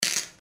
batt; vehicles

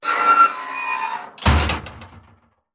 lobby door

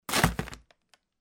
trash plastic

